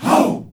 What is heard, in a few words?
GROUP HOW, SHOUT, Heart of Africa; Spectrasonics; Heart of Africa 1; CH. SHOUT1